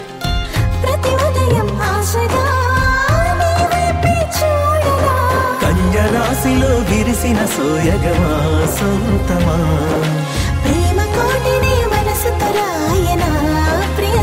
telugu ringtone

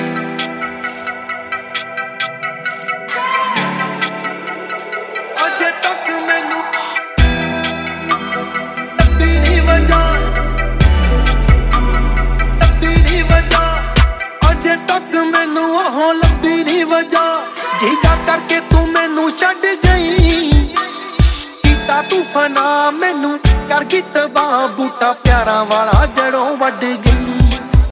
punjabi ringtone

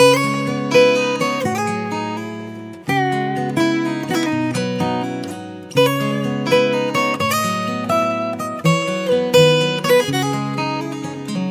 nokia 7 plus guitar retro ringtone

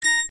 notification ringtone; vivo y31s

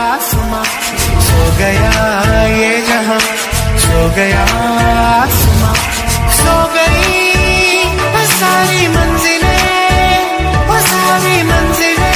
romantic english ringtone

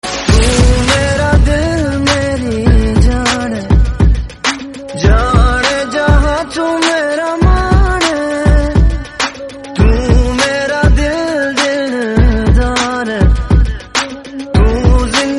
bollywood song tone ringtone

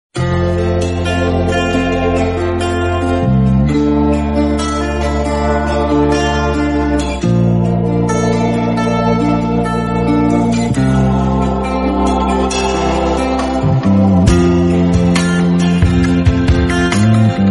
classical soft music tone ringtone

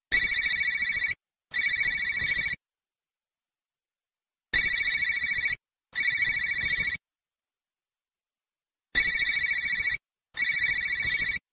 phone ringtone

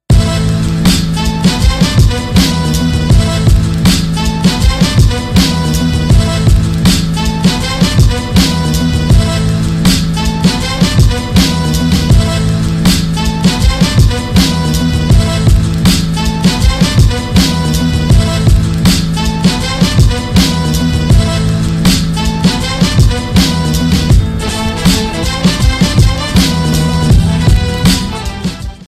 beat ringtone

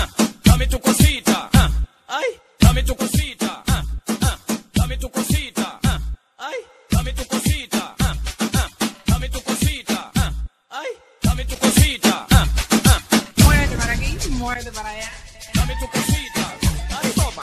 romantic english ringtone; english ringtones

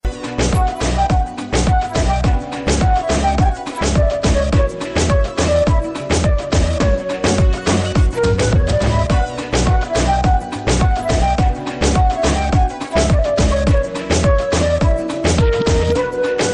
awesome flute ringtone

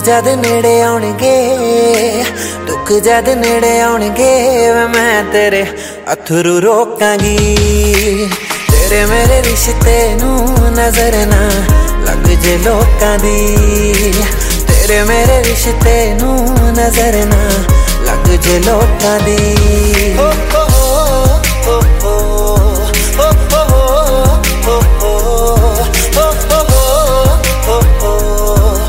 sweet 3d ringtone

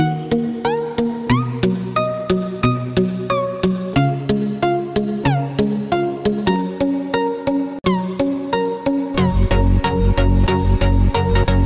english love ringtone

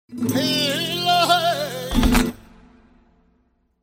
spanish sms ringtone

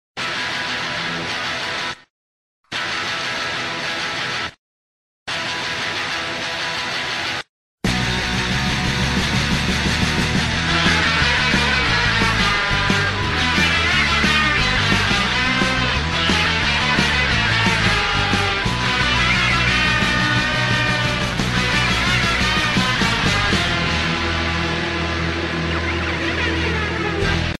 cartoon ringtone

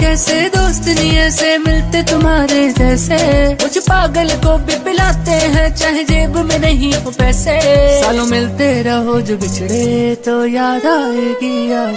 hindi love song bollywood ringtone

